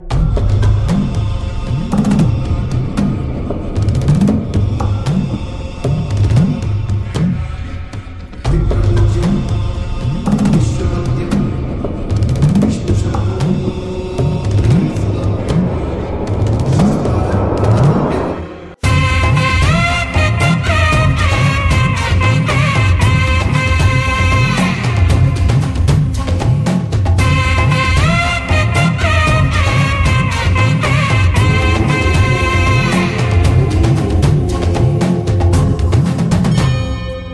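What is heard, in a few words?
background music ringtone; JOURNEY TO SABARIMALA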